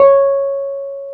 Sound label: PNO Wurly C#6; Keyboards of The 60's and 70's